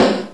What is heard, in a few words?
casio, snare, electro; Snares